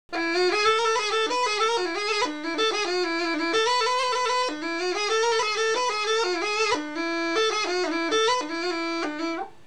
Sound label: Ruchenitsa Y Line in low E key, Gajda; Gajda Lesson